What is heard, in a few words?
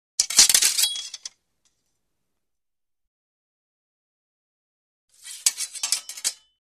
Group Draws & Salutes Several Swords Drawn; Hollywood Edge Foley Sound Library